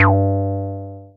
Synths BASS

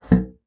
BASSOON; Xsample VOL-6

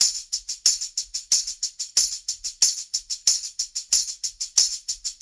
Call And Response Tambourine, 70s Analog Funk; Sonic Foundry (Sony Creative Software); What it Is!

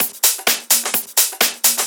Discohouse Loop 128 BPM

